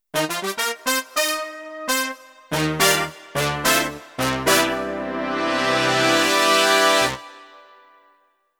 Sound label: GROW BRASS; Ketron EVENT 编曲工作站